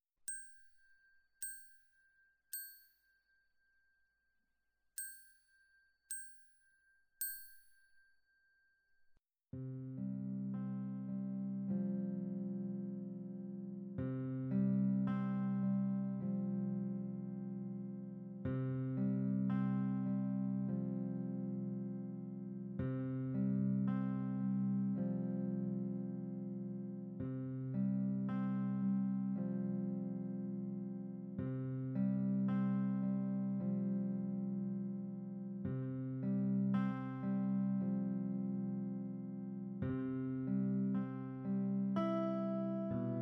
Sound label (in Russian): Колыбельная гитара вступление